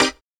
Synth Shot C

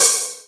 Open Hihat

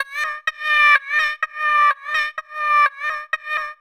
126 D VOCAL LOOP, Funky Underground; Loopmasters; Funky Underground Vol 2

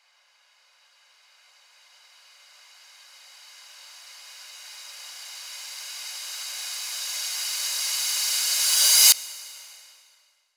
FX Uplifter

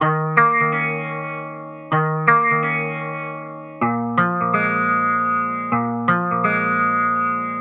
126 Eb Bb MUSIC; funky; Loopmasters; Funky Underground Vol 2

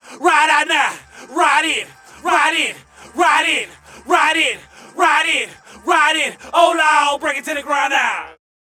VOX

